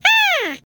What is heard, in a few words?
tickle